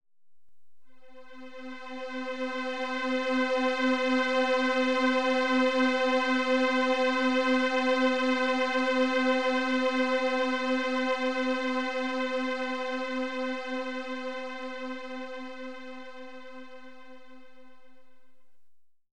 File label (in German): C 257HZ plus Okt; Frequenzen sichtbar gemacht